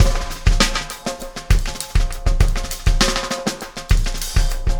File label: Acoustic Drumloop